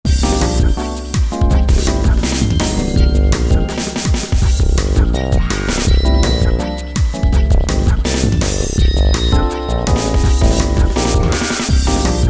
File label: Drum'n'Bass